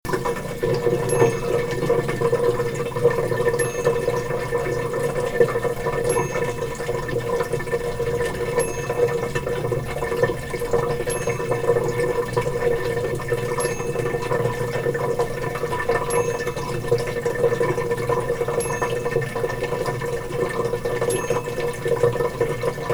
Water Draining

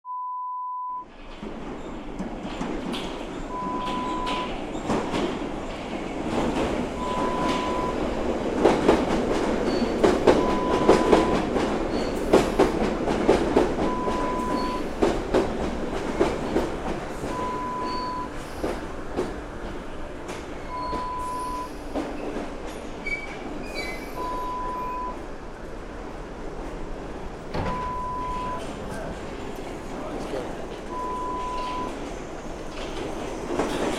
subway train approaching sound

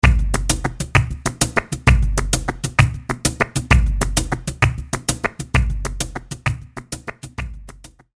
Sevillanas 196bpm

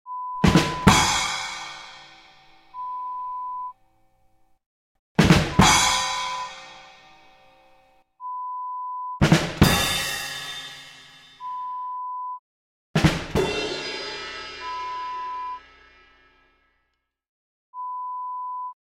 Comedy Drum Rolls

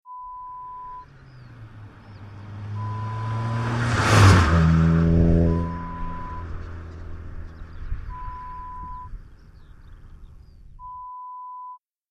Car Drive by

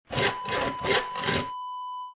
concrete scrub

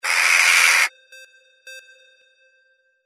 CROW

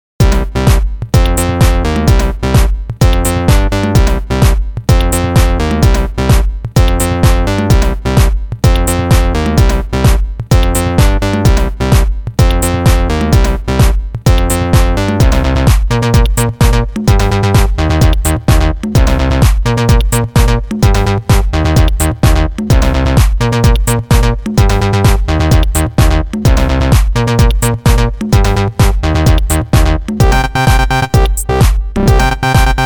bass samples acid; acid records

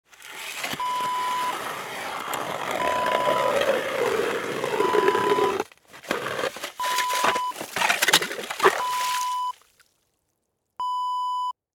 ice auger

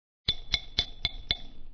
glass tapping

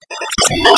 Car Pickup Truck Interior In City Windows Open

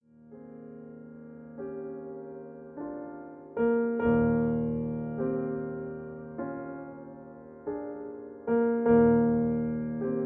Accompaniment E flat; La Vie anterieure

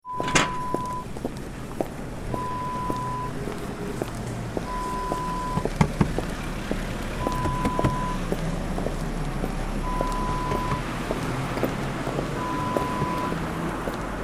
Footsteps Walking through town

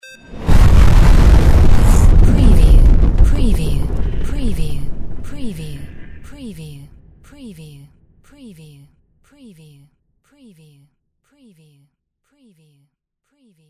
EXP SHORT NO DEBRIS CITY

